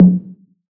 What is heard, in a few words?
tr 808 analog tom f sharp key, Royality free tr 808 synth tom tuned to the F# note.; tom drum samples